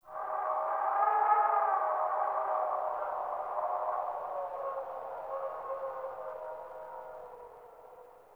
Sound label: Wind Gust Howling Low, Venus Landscape; Warframe; Venus Open World; Ambience